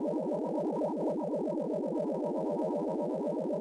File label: hologram hum; Star Wars Obi Wan; XBOX